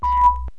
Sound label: ERROR